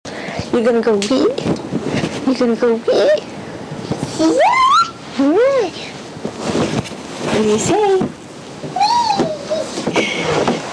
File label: whee